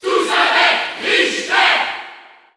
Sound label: Cheer French; SSBU